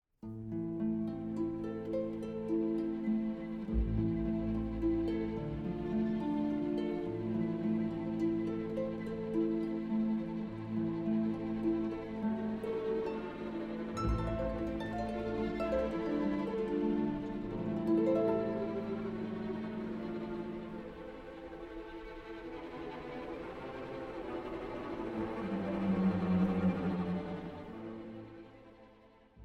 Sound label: piano